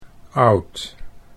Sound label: oud